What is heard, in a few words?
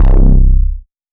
Chonk 808 bass; Zoid Chonk 808 bass BANDLAB